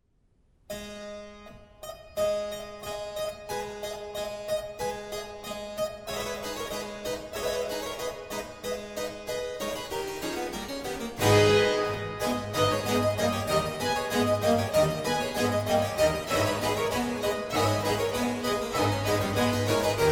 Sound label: Concerto for Four Harpsichords, Allegro; after Vivaldi; BWV 1065